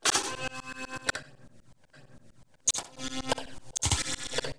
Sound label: sys manipulation generic